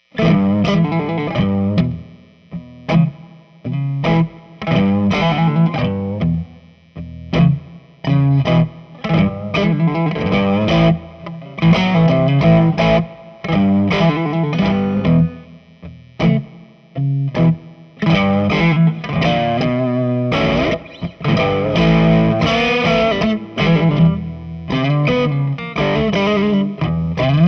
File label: tele blues